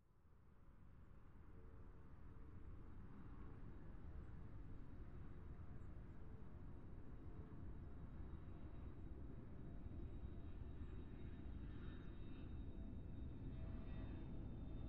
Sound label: Commercial Aircraft, ext airport